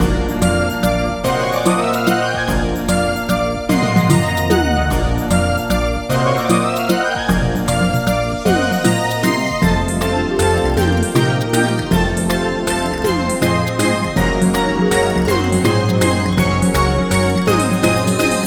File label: battle tempo up